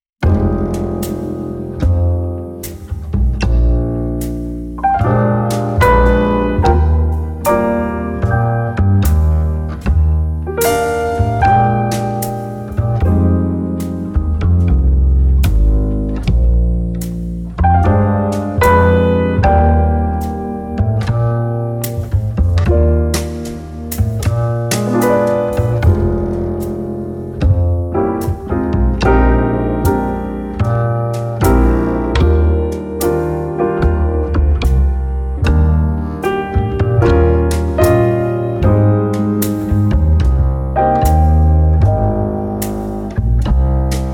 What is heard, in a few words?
Slow Jazz Ballad, flutist; Practice Song No 9; the minor blues scales